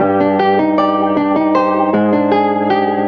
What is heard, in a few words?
guitar